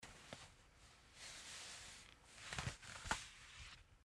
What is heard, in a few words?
Cloth movment